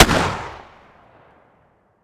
shotgun sup fire atmo ext; gmod; viper

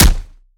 melee character fist; viper

